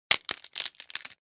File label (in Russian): hrust v spine